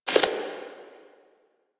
Screen Glitch

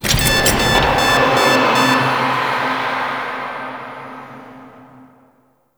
Cap Room Narrow Puzzle Release; Warframe; Ambience; Entrati

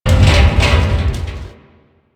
Metal Barrel Slide; Warframe; Ambience; Nineteen Ninety Nine

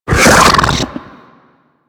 Infested Ancient Run Breathe, Enemies; Warframe

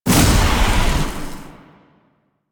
Orb Destroy; Warframe; Zariman; Survival

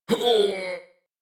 Death, en; Warframe; New War; Teshin

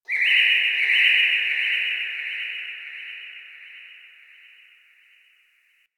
forest distant bird; Ambience; Warframe; Grineer Forest

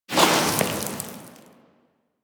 Sword Elemental Fire Whoosh; Warframe; Teshin; Weapons

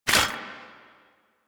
Footstep Default; Warframe; Warframes; Hoplite

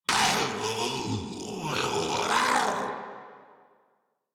Laugh Ghoul Sarge, Barks Battle

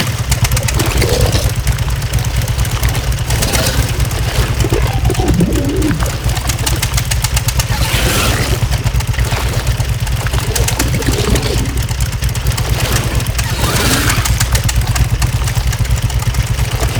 Infested Hammer Idle Loop, Weapons

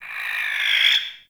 Forest Animal Mid; Ambience

